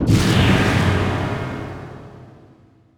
crystal clone cast; dota; crystal maiden

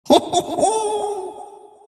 crown laugh; dota; monkey king

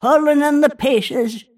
last hit pain; snapfire

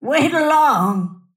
move pain; snapfire

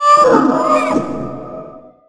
primal layer; weapons; dota; beastmaster

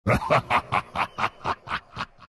shadow demon laugh; dota

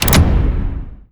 treasure unlock; ui; inventory

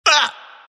embr pain; dota; ember spirit

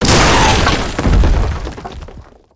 engine destruction; damage; engines; radiant

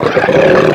death ogre; dota; creep deaths

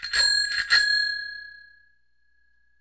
unicycle mount; dota; ringmaster

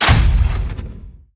land mine plant; techies

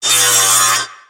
tango cut; weapons; dota; shredder

